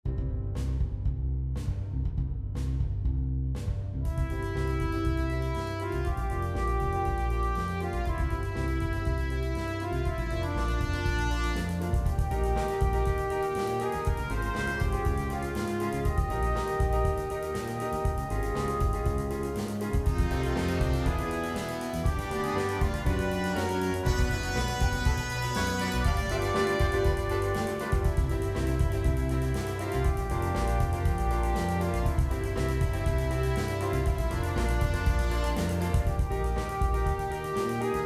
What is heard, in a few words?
Samba